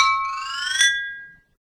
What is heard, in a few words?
Glass; Cymatics; Humble Hip Hop Sample Pack